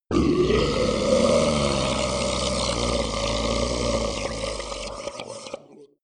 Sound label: monster die gurgle low; kreaturen